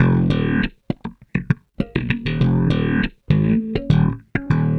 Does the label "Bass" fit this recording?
Yes